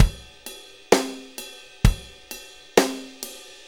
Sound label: 4-4 Loop, Drum Loops, Full Kit Loops; Drum Tools